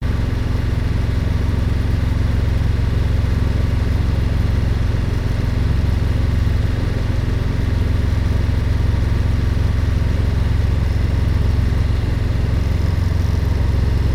Yanmar Engine Fast

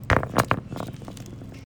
Rock Drops And Tumbles; Ashtihari

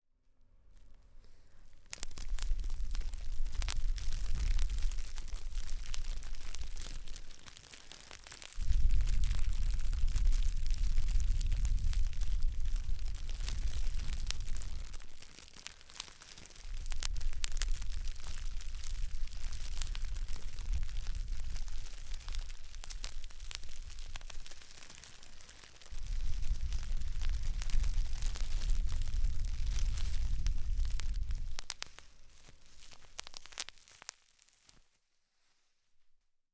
Fire Crackling

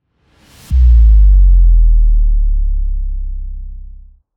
Cinematic Rise And Hit

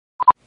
Video Record Snapshot

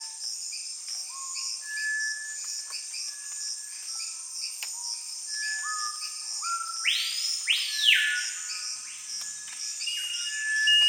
Peruvian Amazon Birds

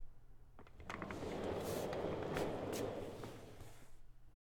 Wheeled Chair Rolling

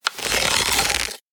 Bow Draw Slow

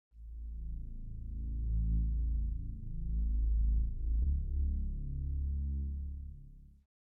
Cinematic Base Sound

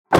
Mitsubishi Imiev Electric Car Door Close

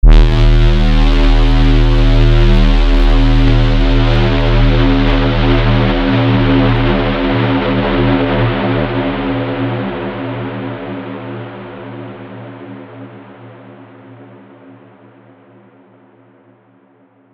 Super Loud Horn

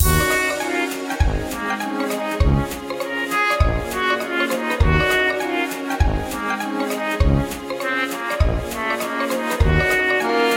Cartoon Game Theme Loop

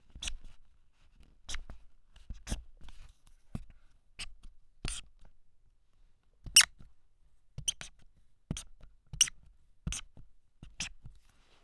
Short Squeaky Sharpie Edding Pen

